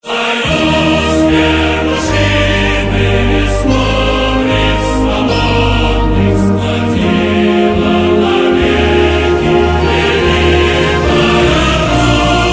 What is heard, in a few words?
mp victory soviet, music; iw4x; iw3